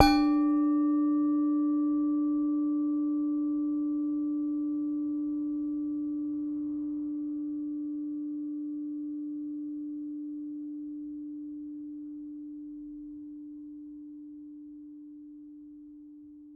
Saron D, Gamelan

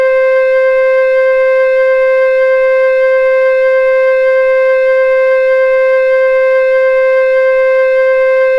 flute

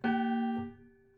Prepared Piano

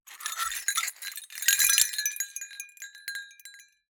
Cowbell Chain, Percussion

